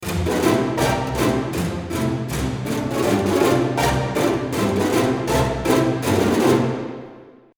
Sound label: Bartok, pizz; Majestica